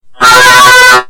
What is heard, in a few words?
backstab; robo mario